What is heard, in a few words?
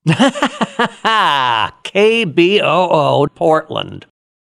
laugh; The Weatherman; KBOO Radio IDs; legal ID